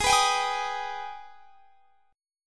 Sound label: G sharp Minor Major 7th G sharp down; G#mM7 strummed